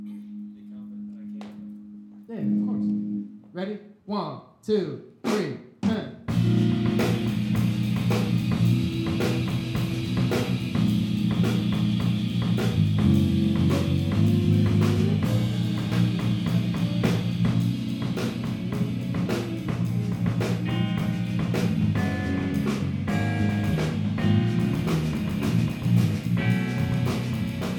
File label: Guitar solo pedal